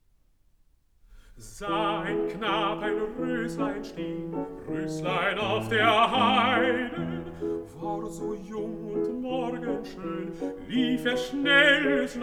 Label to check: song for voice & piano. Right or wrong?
Right